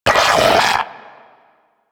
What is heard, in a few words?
Arachnoid Vocal Pain